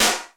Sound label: EMU SD, DRUMS; Crypton - DrumBase5000; CD DRUMS #1